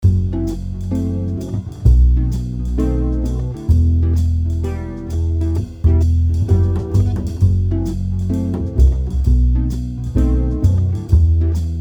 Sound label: Minor Loop